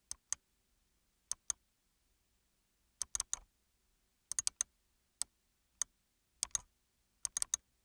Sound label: mouse click